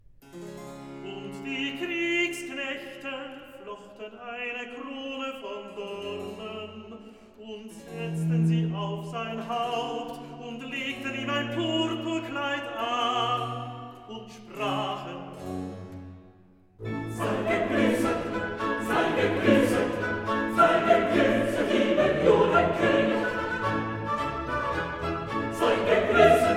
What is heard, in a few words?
Recitativo, Coro; evangelist Und die Kriegsknechte; Sei gegrusset; Klassika; Handel Masterworks